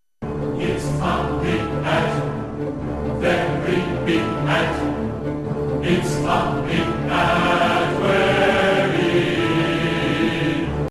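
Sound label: Devil Music Message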